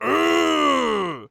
Cartoon Human Male Scream Cartoon Monster Growl Scream